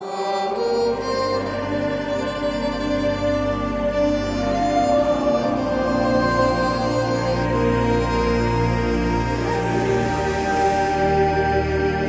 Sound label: Intro Love BGM; RRR